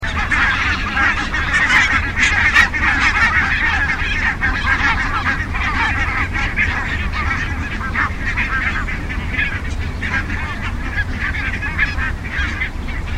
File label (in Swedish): Vitkindad gas